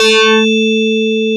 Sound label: marimba bell, Synclavier, a3; Retro Sampler